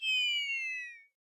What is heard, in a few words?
terrain fall; sfx; sheepy Terrain